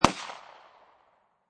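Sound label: Pistol Smith & Wesson P99 40 Cal Single Shot Exterior At 45'; Gun / Pistol: Smith & Wesson P99, 40 Caliber, Single shot, Exterior, at 45 feet distance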